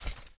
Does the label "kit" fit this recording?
No